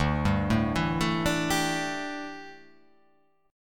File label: D Suspended 2nd Suspended 4th D down, chord; arpeggiated